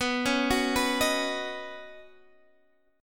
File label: Eb Minor 7th Sharp 5th B